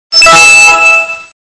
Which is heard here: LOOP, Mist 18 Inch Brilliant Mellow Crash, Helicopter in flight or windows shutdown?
windows shutdown